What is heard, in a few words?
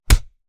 Fight Impact; SoundsCrate